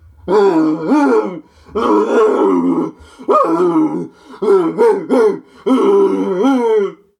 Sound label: Rage